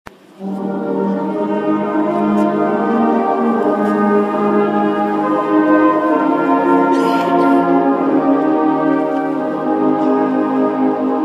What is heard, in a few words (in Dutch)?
Fanfare; O Kinde klein